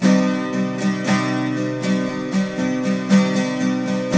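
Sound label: 12 string minor C#, guitars; Spectrasonics; Hans Zimmer Guitars VOL-1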